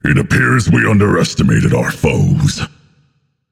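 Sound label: non-verbal boohoo; smite; atlas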